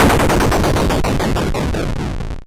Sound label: cluster, explosions